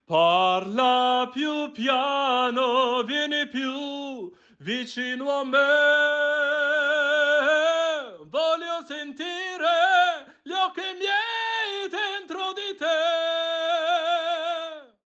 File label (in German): Piano Vorher